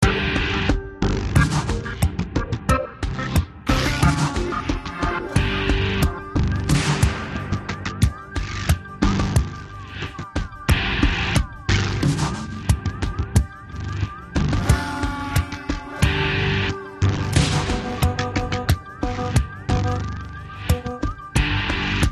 Loop Hacking; tales from the borderlands gamerip 2015

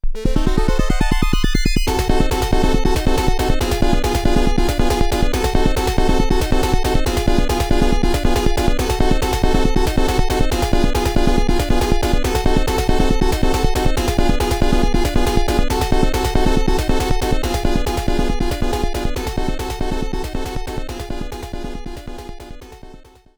Trap; Arcus; Sharp X1

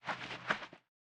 Grass; lethal company; ambience; windows

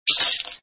SFX sound (coin sound)

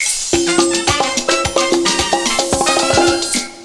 Fanfare; Mario Kart Arcade GP 2

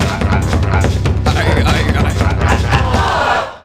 Jingle Dance, Tribe

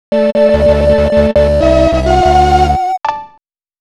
Give Up, GAME OVER; super bomberman soundtrack collection kaboom

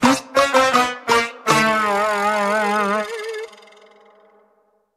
jingle lose

